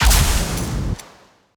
Rocket Launcher Fire; Sci Fi Weapons Cyberpunk Arsenal

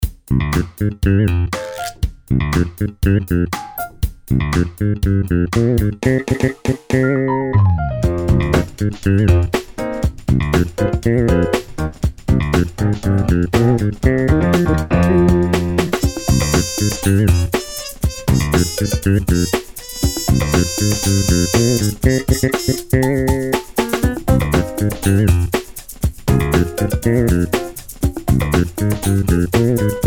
BGM; summersports

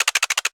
Empty Clip Burst; Sci Fi Weapons Cyberpunk Arsenal; FruitMaster; Shapeforms Audio Free Sound Effects

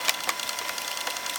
LOOP, Cassette; Shapeforms Audio; FruitMaster

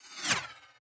Bullet By Centred Fast; Sci Fi Weapons Cyberpunk Arsenal

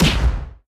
defense; dairybeatboss

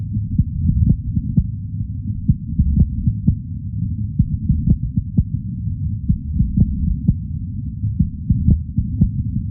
ambience heartbeat loop; Shapeforms Audio Free Sound Effects; Dystopia; Ambience and Drone

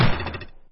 Hit; smartbow; BowArrow

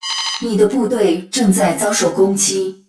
login

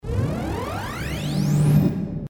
Charge Power

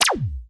Classic Blaster Fire; Sci Fi Weapons Cyberpunk Arsenal

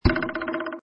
hit; BowArrow

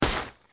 Jump Down; fightingsports

